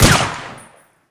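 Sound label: p228 fire silenced, weapons; kuilu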